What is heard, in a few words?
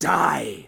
Curse Vocal; NPC; Dominus Combat